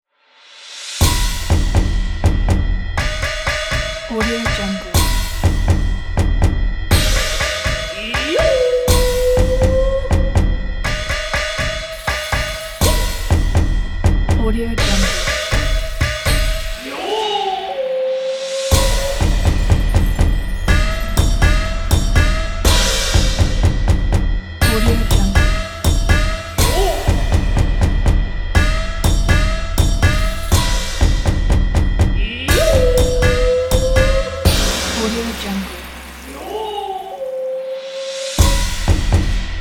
cinematic asian percussion drums

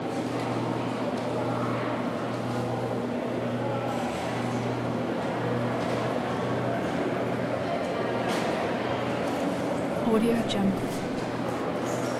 crowds walla vaccination center italy loop

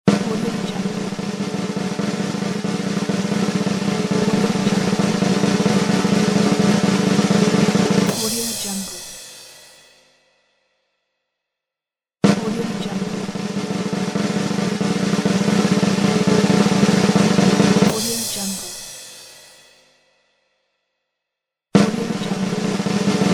the drums roll

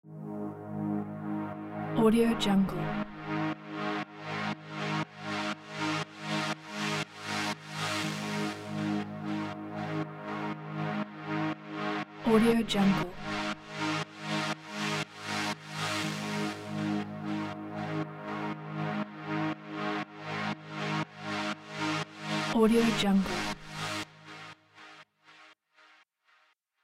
pulse atmosphere

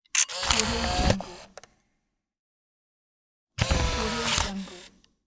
digital camera zoom

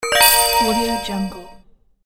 coin sfx

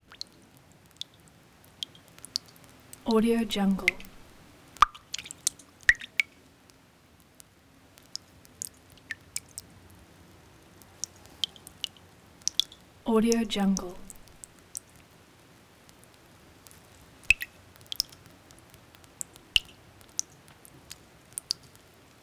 water drips into filled bowl cave water drops

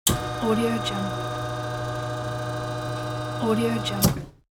dental chair hydraulic lift

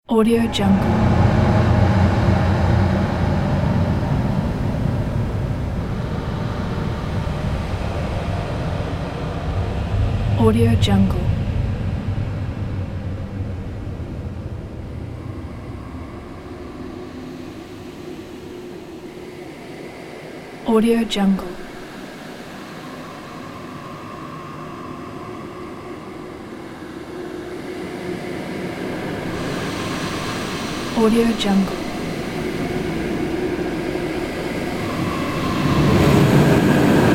evil mystical ambience

